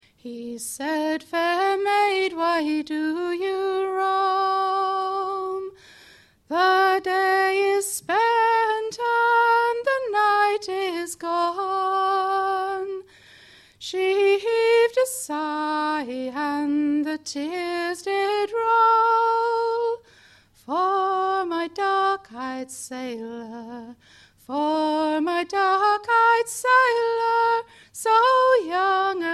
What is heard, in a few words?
unaccompanied singing, two traditional songs; Dark Eyed Sailor; Bonny At Morn; ashington folk club